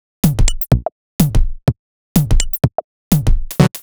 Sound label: 125bpm, House Drumloops